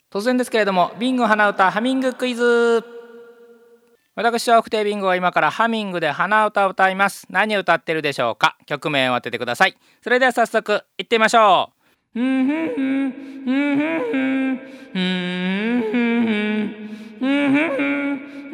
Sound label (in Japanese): hanauta